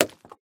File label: Apple Hit; hello neighbor pre-alpha alpha 1